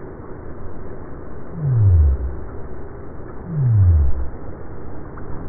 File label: sei whale doublet